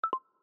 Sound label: Health Reading Fail Haptic, iOS Sounds